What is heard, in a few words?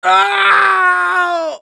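pain critical death es; Spy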